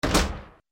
azotar puerta

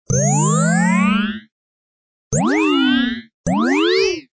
teletransportacion

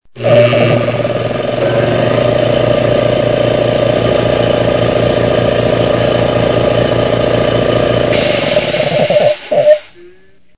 Compresor

